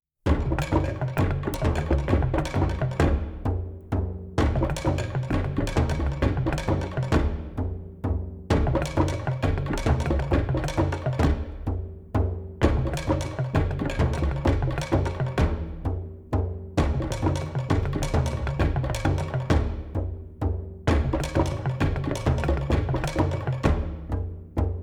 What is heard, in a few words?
Distant Drumming; Return to the Blue Lagoon